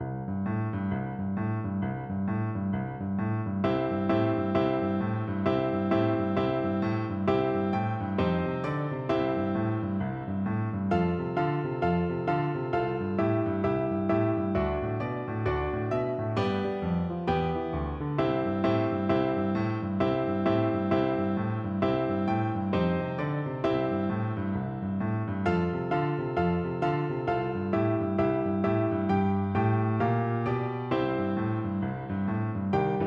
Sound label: boogie piano duet